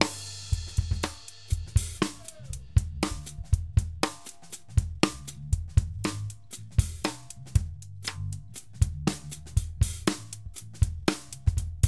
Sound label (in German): Einzelspuren BD Clear SN Pop OH Bright Clean Bus